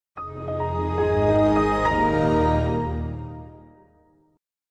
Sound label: microsoft windows xp startup sound